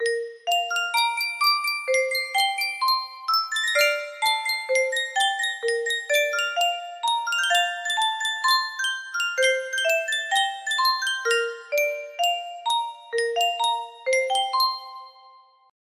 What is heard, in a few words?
music box